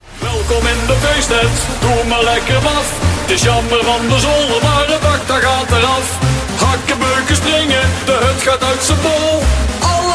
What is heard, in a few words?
reclame ringtone